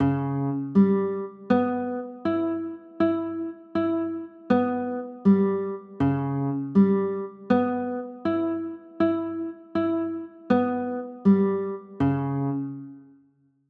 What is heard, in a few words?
c sharp m7b5